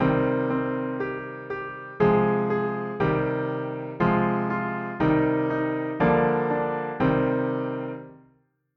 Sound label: Twinkle Twinkle with chords minor